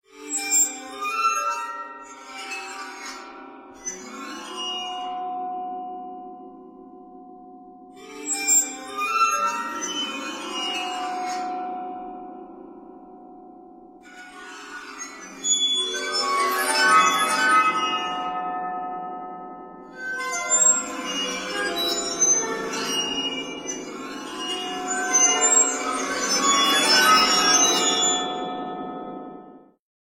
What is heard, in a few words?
Horror Creepy